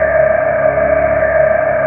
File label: DRONE INDU, Synth FX Misc